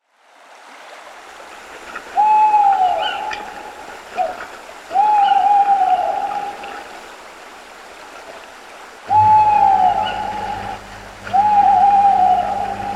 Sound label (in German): Eule